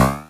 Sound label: Drums, Hits; DCM8